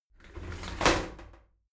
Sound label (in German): shower slide doors open regular, tueren